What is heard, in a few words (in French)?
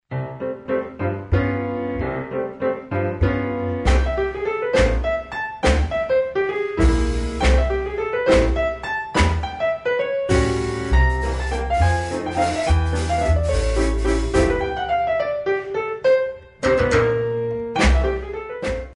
la java des klaxons